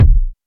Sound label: kick b key, Royality free steel kick drum sample tuned to the B note. Loudest frequency: 83Hz